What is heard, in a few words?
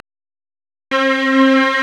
STRNG; CMP - Analogon